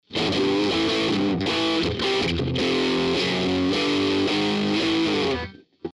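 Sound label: HEAVY CHORD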